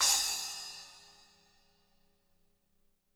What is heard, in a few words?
splash, splashes